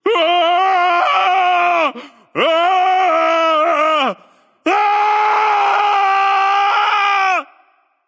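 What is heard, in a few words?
nonverbal fear; smite; voicepack; merlin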